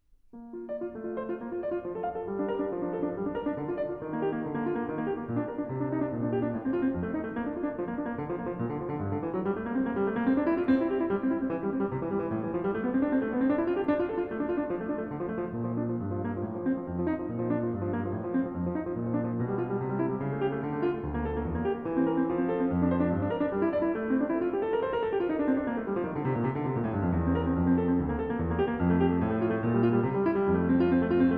Präludium B-Dur; BWV 866; J.S. Bach; Das Wohltemperierte Klavier Book 1

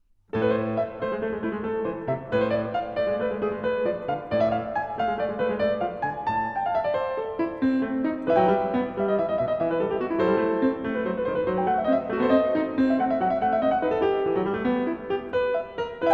Präludium a-Moll; BWV 865; J.S. Bach; Das Wohltemperierte Klavier Book 1

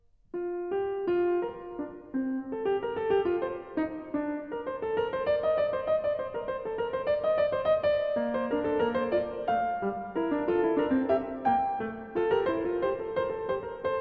Fuge B-Dur; BWV 866; J.S. Bach; Das Wohltemperierte Klavier Book 1

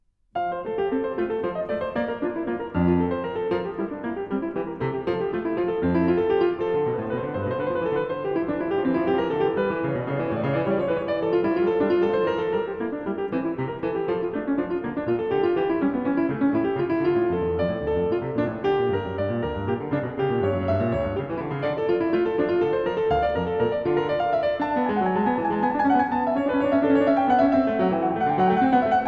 Präludium F-Dur; BWV 856; J.S. Bach; Das Wohltemperierte Klavier Book 1

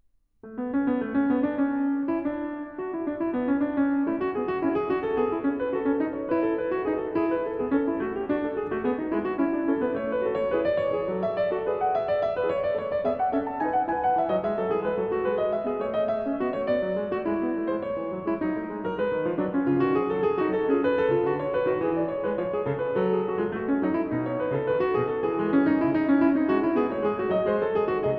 Fuge A-Dur; BWV 888; J.S. Bach; Das Wohltemperierte Klavier Book 2

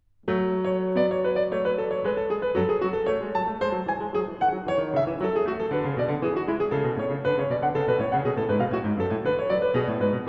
Präludium fis-Moll; BWV 859; J.S. Bach; Das Wohltemperierte Klavier Book 1

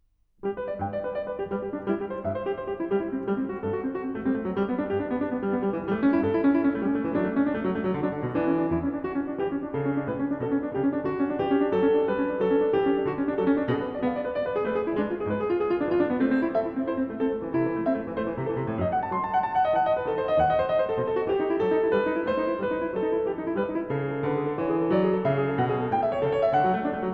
Präludium G-Dur; BWV 860; J.S. Bach; Das Wohltemperierte Klavier Book 1

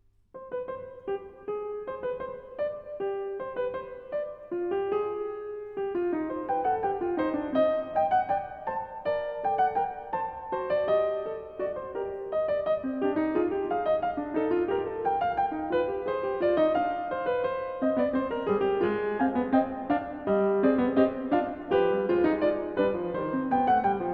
Fuge c-Moll; BWV 847; J.S. Bach; Das Wohltemperierte Klavier Book 1

